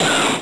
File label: bigun